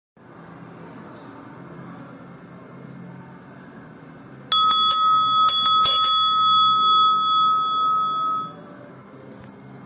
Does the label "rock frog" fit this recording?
No